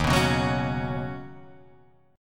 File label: Eb Minor 7th Sharp 5th Eb down, chord; strummed